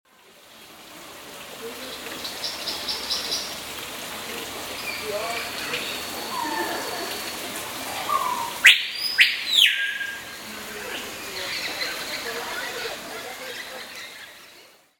Im Tropenhaus, Zoo; erlebnis zoo

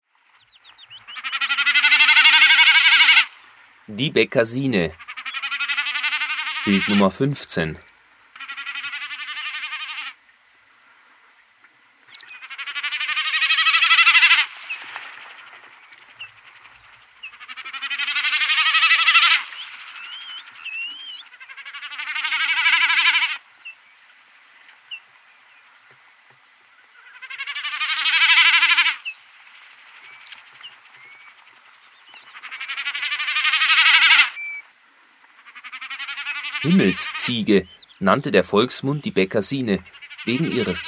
Bekassine; vogelstimmen in heide moor und sumpf

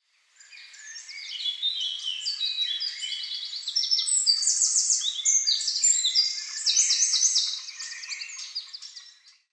Ein Vogelkonzert im Wald; chants doiseaux les grangettes suisse

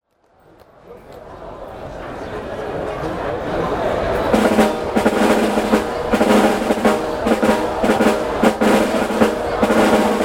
Schuetzenmarsch, Oktoberfest; oktoberfest munchen; Oktoberfest 2010

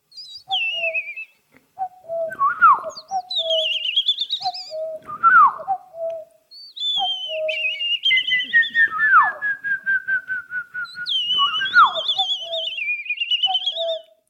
Waldkonzert, Vogelexkursion; auf vogelexkursion

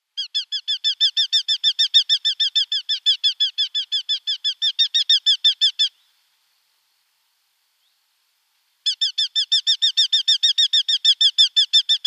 Baumfalke, Falco subbuteo; Greifvoegel Falken; raptors and falcons

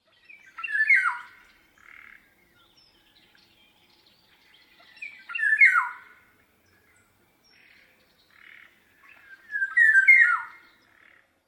PIROL Gesang M Spanien; vogelportraet; oriolus oriolus

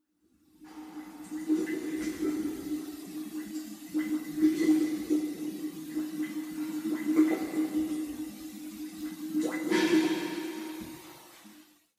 Weingaerung; rund um den weinberg

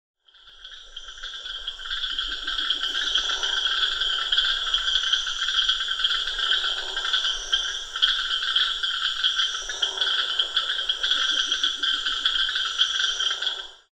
Froesche am Rio Negro; anavilhanas

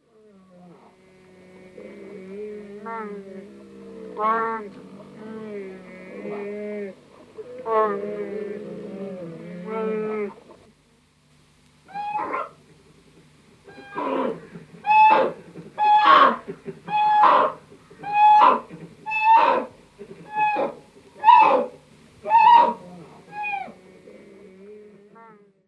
Europaeischer Braunbaer; Baeren; baeren unterwegs bruno und seine freunde

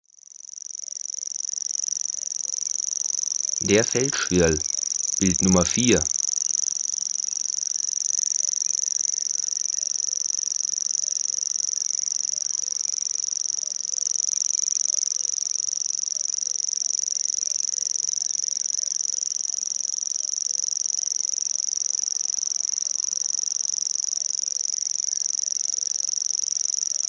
Feldschwirl; vogelstimmen am wasser

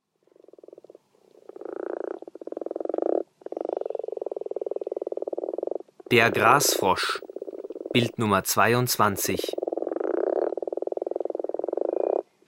Grasfrosch; heimische tierstimmen; lurche; saeugetiere lurche insekten

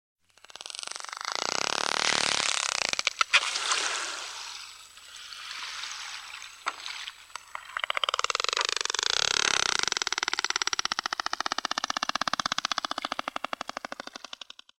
Noerdlicher Entenwal, walgesaenge; Walstimmen

